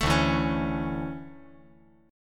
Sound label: D Major 7th Suspended 2nd